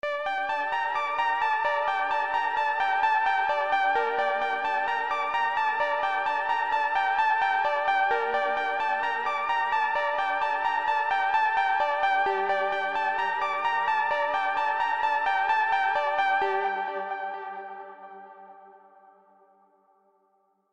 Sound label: ufo music